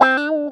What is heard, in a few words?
Synth Shot; Vengeance Samples